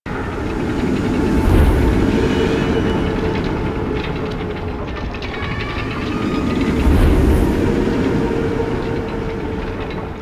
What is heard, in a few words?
Windmill Loop; Warframe; Duviri